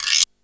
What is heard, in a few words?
guiro, Yamaha RY-8